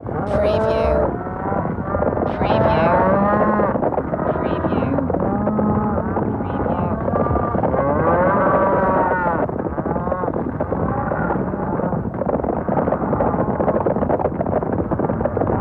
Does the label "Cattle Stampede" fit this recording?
Yes